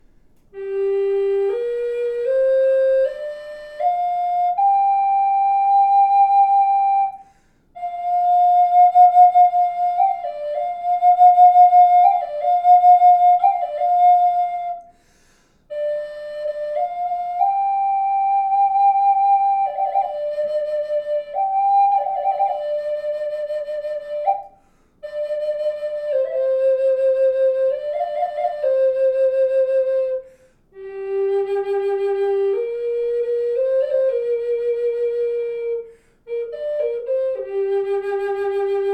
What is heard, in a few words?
natural, Flute